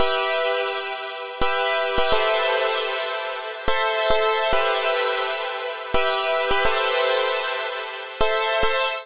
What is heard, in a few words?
Am 106 Bpm; Star Eyes; Vibrations by ModeAudio